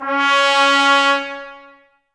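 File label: trumpets C#, brass; 90 s Sample CDs; Miroslav Vitous; Woodwind Brass Ensemble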